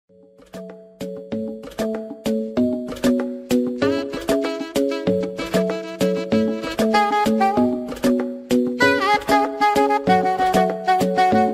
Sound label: ringtone instrumental